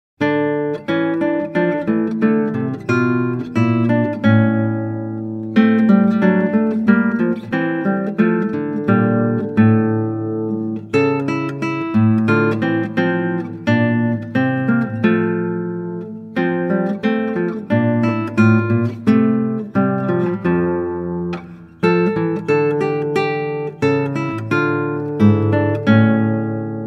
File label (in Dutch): Klassieke Gitaar; Bach 50 Solos